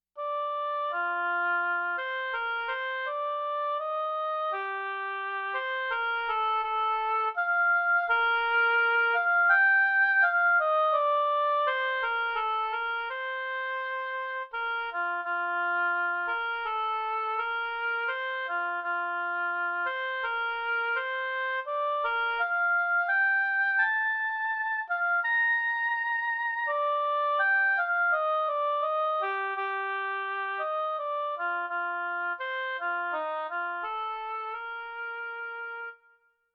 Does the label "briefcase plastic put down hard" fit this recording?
No